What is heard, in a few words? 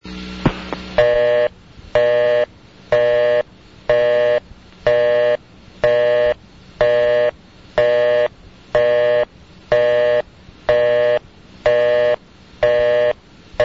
busy, Bell System